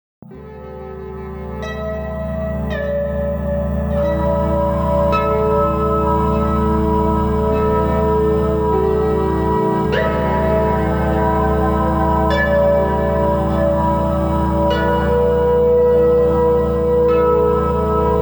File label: Bird Sounds; Meditation